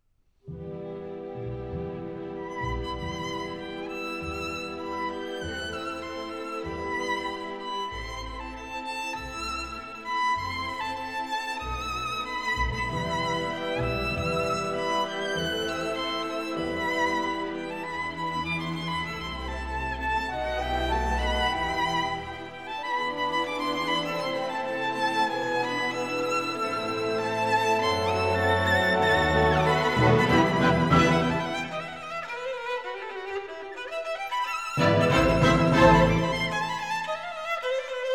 Violin Concerto

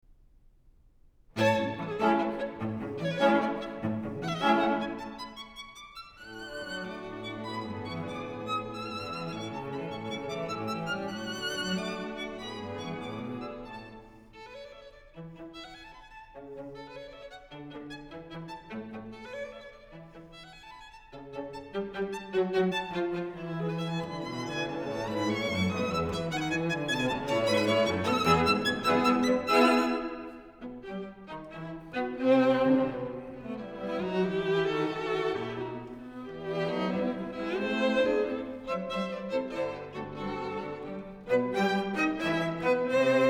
String Quartet No. 5 in A Major, Allegro; Ludwig van Beethoven; Beethoven - String Quartets No. 1-6, Op. 18

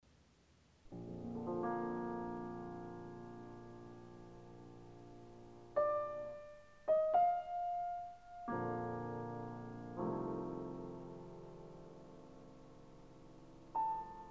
Piano Sonata No. 17 in D minor; Wilhelm Kempff; Beethoven; Piano Sonatas

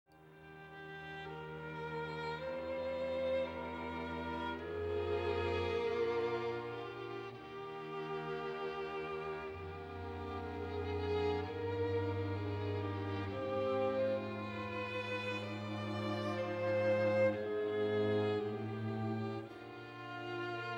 String Quartet in B-Flat Major, Cavatina. Adagio; String Quartet No. 13; Op. 130; Ludwig van Beethoven; Beethoven - String Quartets Nos. 1-16

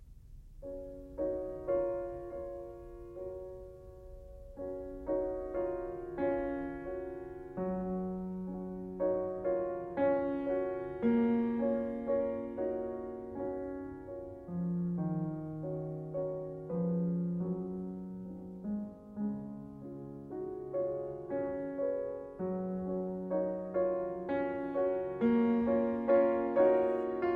Prelude in G flat major, piano; 24 Preludes

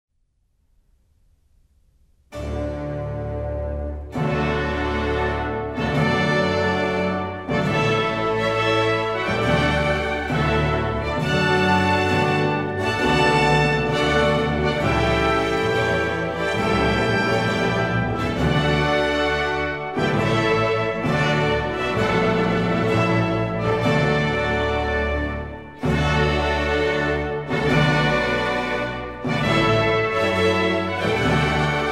Music for the Royal Fireworks

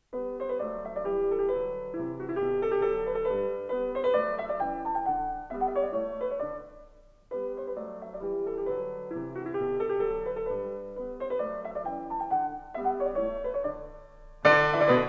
Piano Sonata No. 25 in G major; Wilhelm Kempff; Beethoven; Piano Sonatas

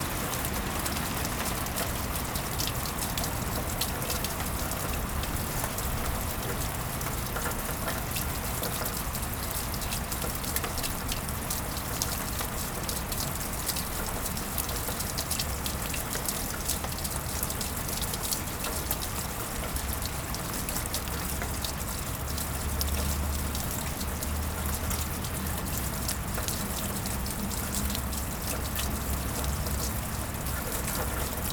Rain In Gutters, Field Recordings Oregon; Omni Gardens

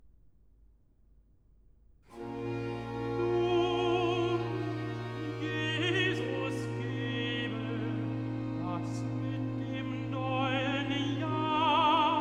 Recitative (Tenor); Singet dem Herrn ein neues Lied, BWV 190; Nun, Jesus gebe; Bach; Robin Blaze; Cantatas, Vol. 21

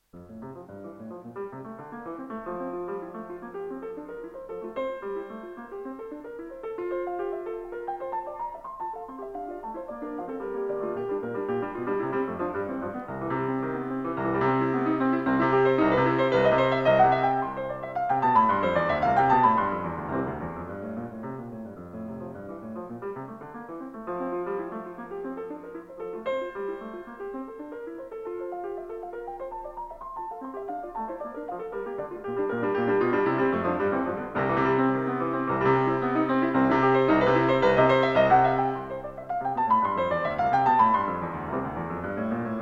Piano Sonata No. 22 in F major; Wilhelm Kempff; Beethoven; Piano Sonatas

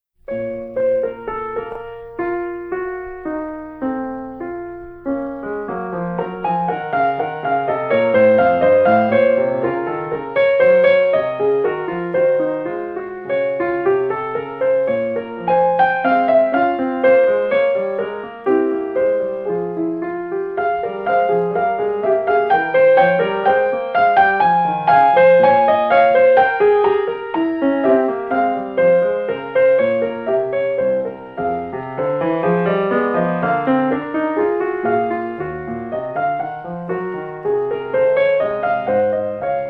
Prelude 22 In B Flat Minor; J S Bach

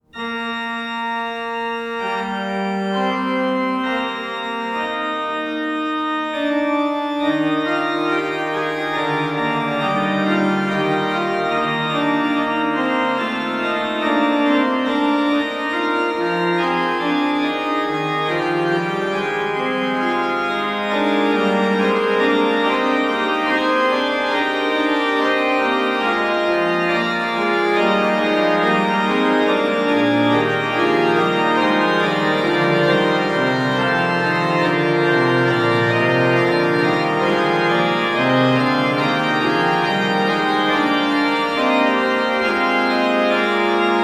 Fugue in E flat major, Bach Great Organ Works; Prelude Fugue in E flat major, BWV552 St Anne - II. Fugue; Johann Sebastian Bach

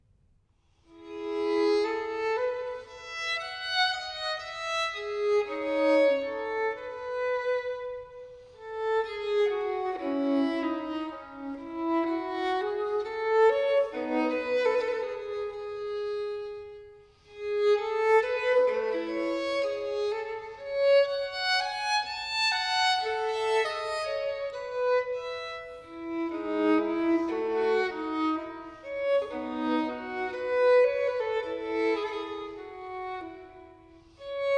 Violin Sonata in C Major Largo; Violin Sonata No. 3; BWV 1005; Bach

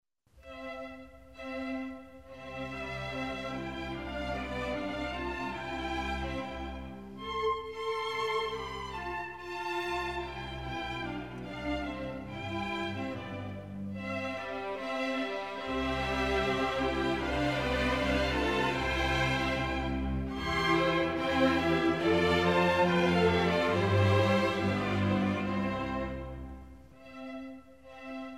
Serenade No. 13 for strings in G; Wolfgang Amadeus Mozart; Mozart Greatest Hits

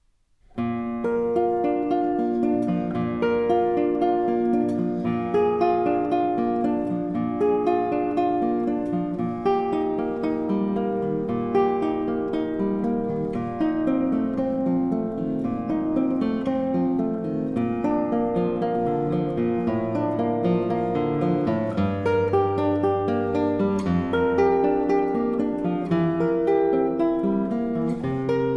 Cello Suite No 4 in E Flat Major, guitar, Prelude; Bach Transcriptions and Arrangements for Guitar

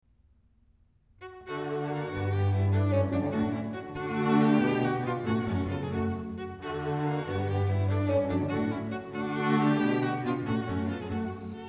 String Quartet in D Major Allegro; String Quartet No. 3; Op. 18 No. 3; Ludwig van Beethoven; Beethoven - String Quartets Nos. 1-16

